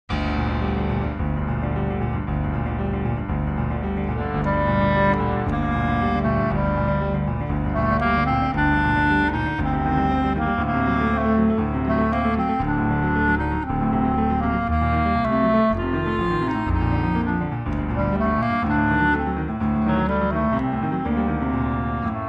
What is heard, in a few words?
Clarinet Sonata Clarinet